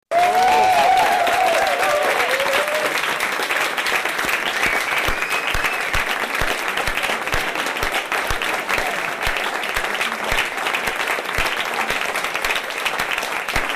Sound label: Cello